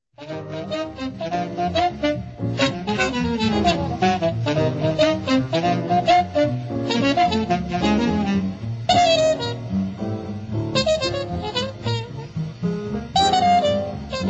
Bebop Romp

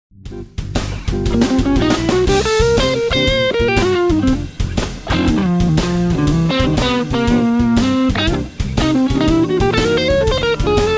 Diminished, jazz

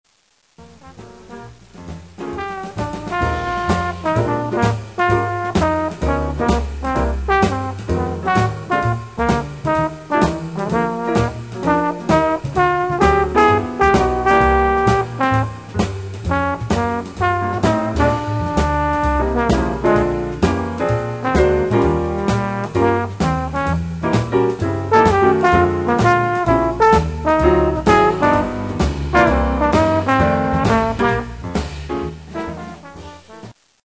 trombone; Jazz Conception for Trombone

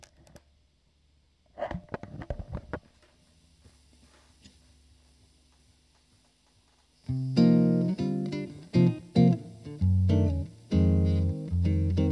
Chord Melody, chitarra

